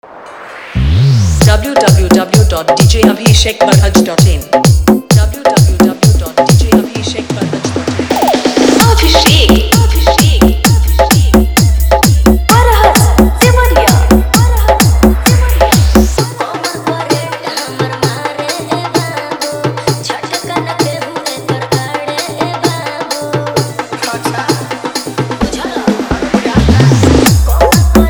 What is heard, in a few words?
Hard Vibration Dholki Mix